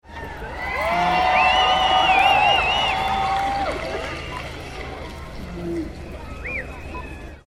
Music Festival Crowd Cheer

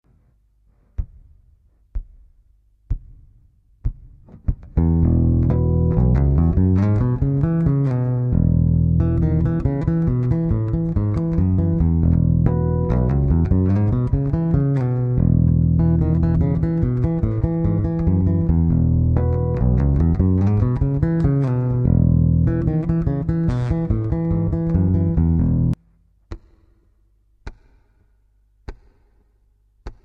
E major exercise

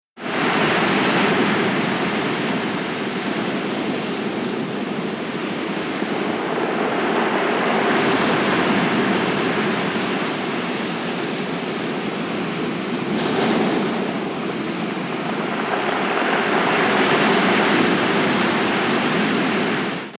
Boulder Beach

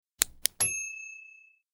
Pen Click

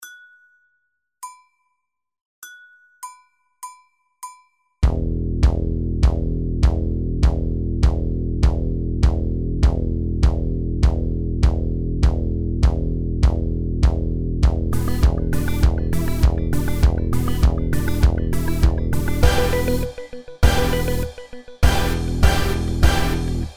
DISCO DRUMS; DISCOveryDRUMS

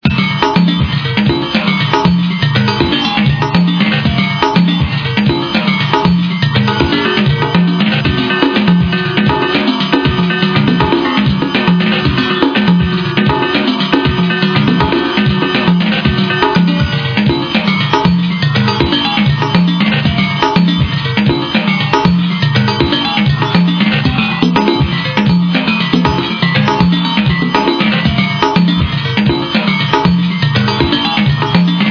Noisy Percussion

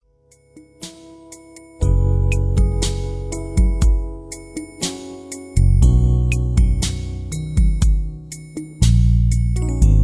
INSTRUMENTAL

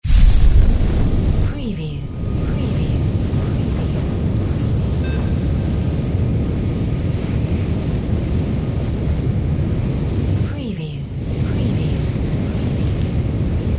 sci fi fire spell full; windy beach audio

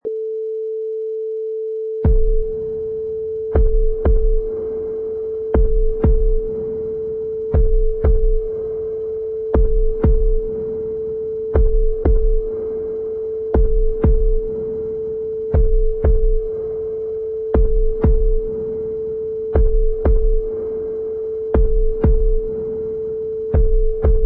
Evil Heart Beat

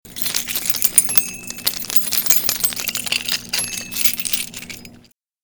Key Jingle

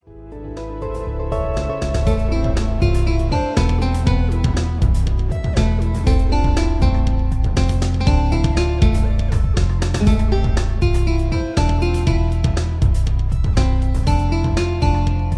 melody

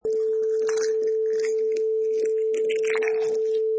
Egg Hatching

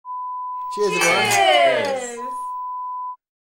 Cheers

